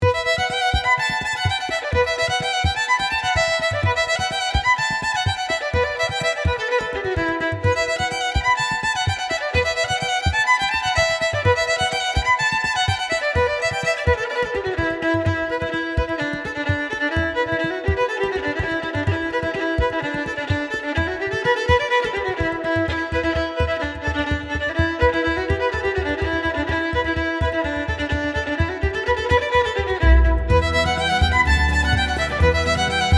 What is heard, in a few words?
World Violin, Reel; Blue Mountain Reel